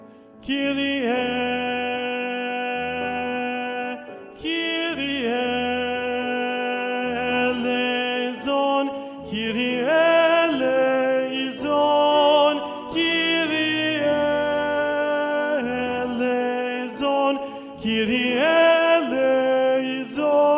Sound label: Basses